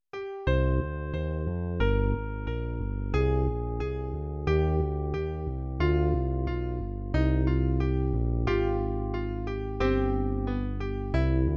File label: Gavotte